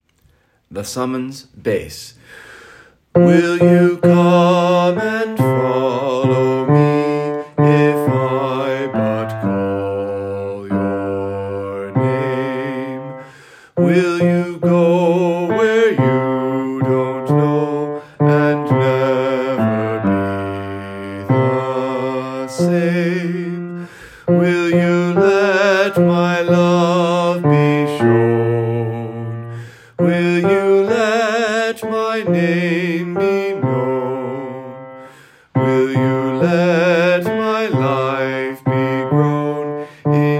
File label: Bass; St. Anthony of Padua - Choir Sunday